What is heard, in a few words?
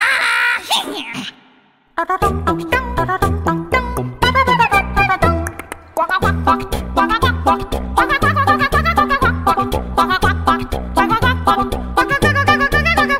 Sound Effect Acapella; Angry Birds